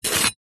knife scrape dirt

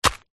footstep single forest path track; zapsplat

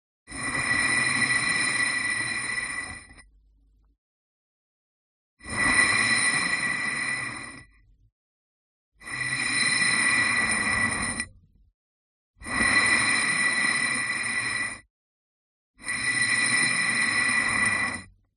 contact mic bow glass vase

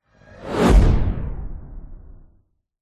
whoosh fast powerful; sound design; zapsplat

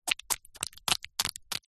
slug eat designed, animals; zapsplat

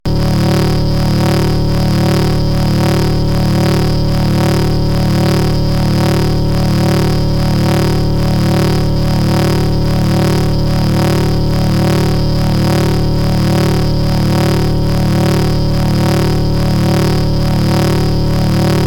Wobble Drone

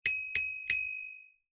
bicycle bell thumb lever ring x3; vehicles

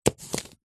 apple chop slice with large knife

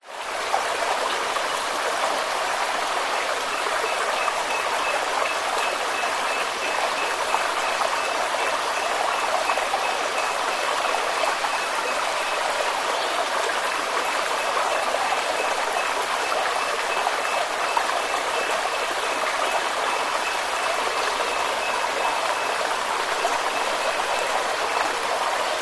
nature stream forest

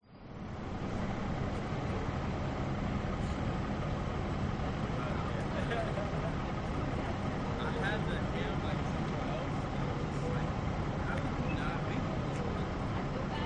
city ambience few people traffic pasadena usa

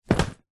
foley luggage suitcase leather set down concrete; zapsplat

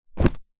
foley laptop case fabric empty set down carpet

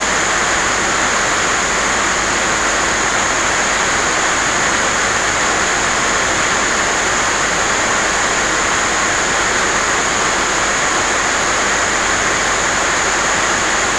nature rain med heavy on metal roof ranch

